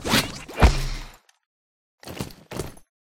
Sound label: emote flippin sexy foley; fortnite emotes